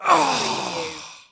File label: Human Death, Male Dying Sigh, Grunt